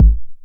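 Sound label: 909 BD tape, kick drum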